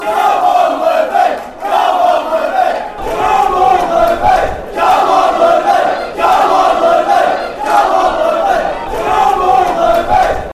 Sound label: come, chants; livi